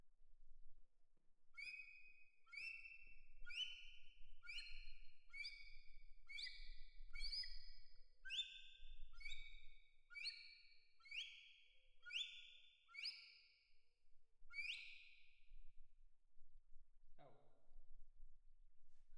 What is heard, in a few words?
Perc Main